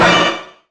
old skool stab

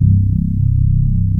CMI ORGAN

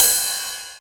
909 Ride; Novation Drumstation

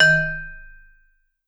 D#2, Vibes; Sampleheads; Dave Samuels; Marimba & Vibes

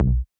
House Elite Bass; Big Fish Audio; Old Skool House; OSH Bass

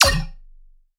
Riddim Zap

